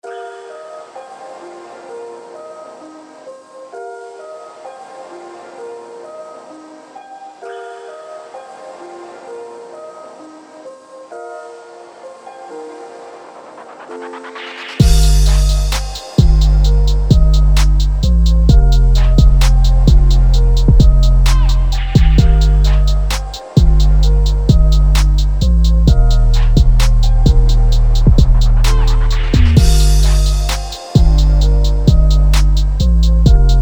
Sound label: Trap; Toolkit 2